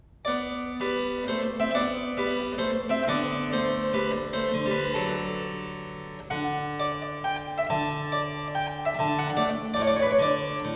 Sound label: Minuet in A Flat Major; The London Sketchbook; KV.15ff